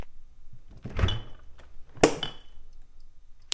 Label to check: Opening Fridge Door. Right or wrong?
Right